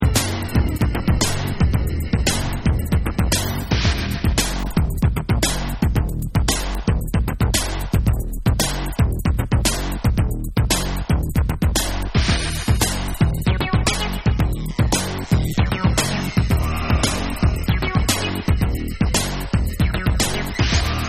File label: Basstrumental